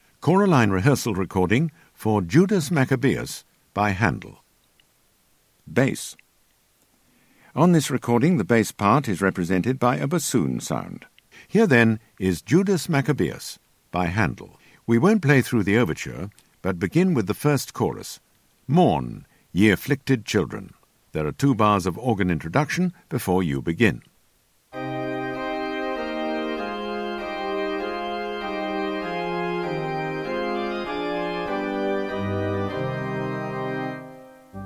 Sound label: Bass; Handel Judas Macc